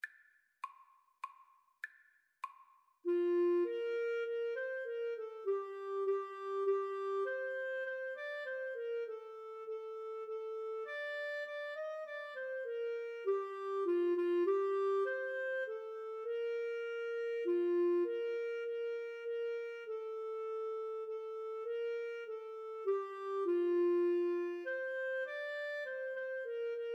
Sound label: clarinet saxophone duet